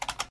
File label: click; Electronica Digitales; logic gates